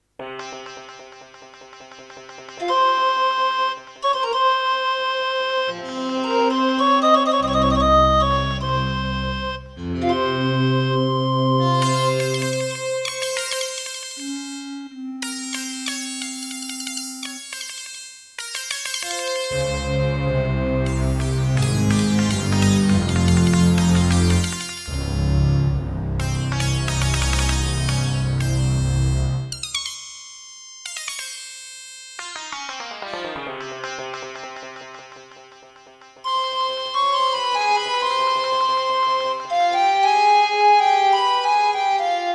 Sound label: Yamaha MU2000EX GSMODE; Prince of Persia 2 - Intro